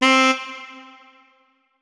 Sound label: notes, saxophone; Hideout Furniture